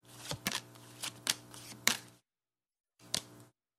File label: Playing Card Sound Effects Shuffle Deal Flip Slide Place Fold